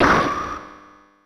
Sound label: Moderate Hit; Super Smash Bros